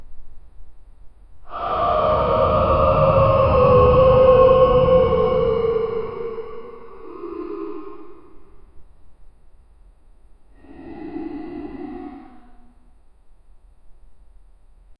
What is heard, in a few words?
a distant screech echoed; pain